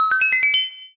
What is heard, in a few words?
1-Up; MLPJ